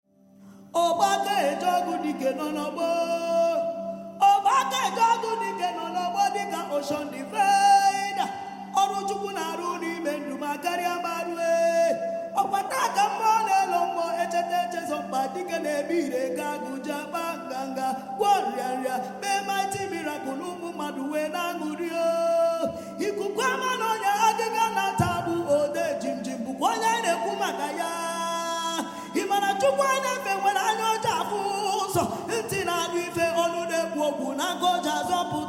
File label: Chant